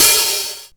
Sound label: Hat Open, linndrum; classic machines